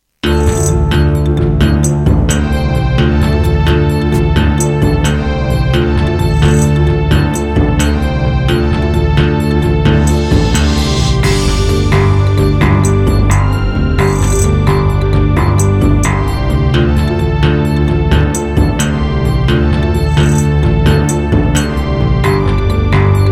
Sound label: Tascam Pocketstudio 5, wavetable; Heretic